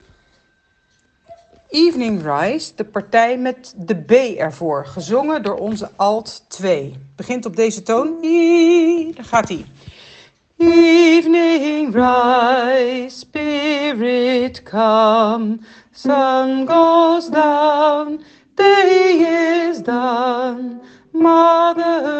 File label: evening rise